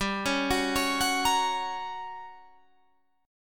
G Minor Major 7th Flat 5th, G, down, Guitar, Drop D